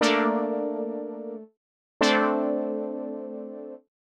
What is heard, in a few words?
120 Pad Chords C, Keys, Nu Jazz House; PowerFX